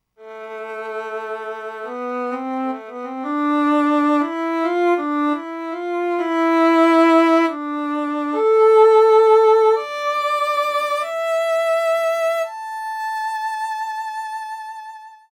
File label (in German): Violine